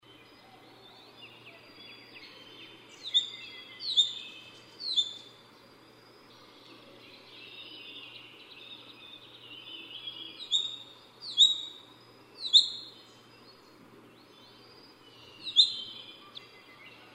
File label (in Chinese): 鳳頭蒼鷹; 鳳頭鷹; 鷹科/留鳥